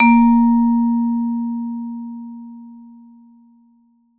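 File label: vibe med ff A#2 right, Marimba & Vibes; Sampleheads - Dave Samuels Marimba & Vibes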